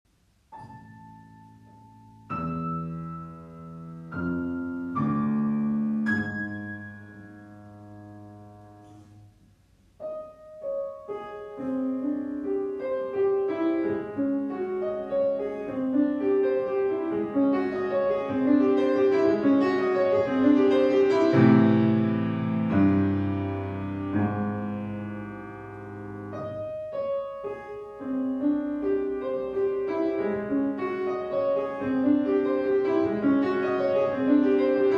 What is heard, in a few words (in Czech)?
Poeticke preludium; Dlouhy